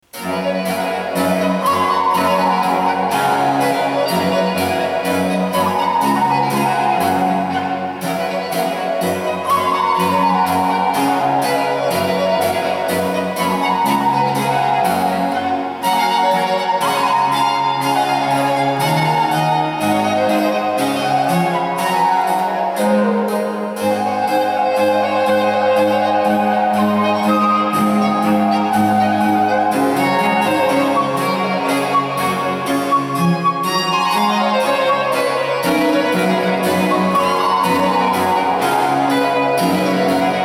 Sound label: tambourin; J.M. Leclair; 2e Recreation de Musique in D gr